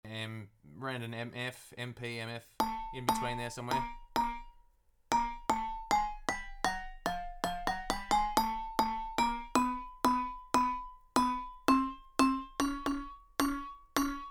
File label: Short chromatic vibes